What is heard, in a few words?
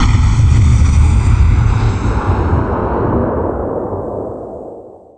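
ship weapon; imp devastator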